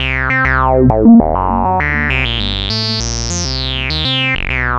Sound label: acid 100; synth loops